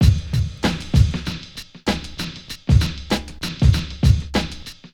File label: Old School Break, Drums